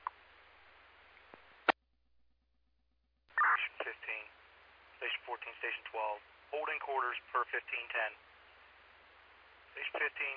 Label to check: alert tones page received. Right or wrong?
Right